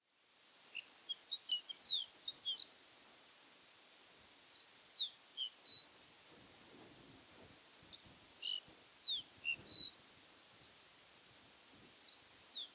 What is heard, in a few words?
horned lark - eremophila alpestris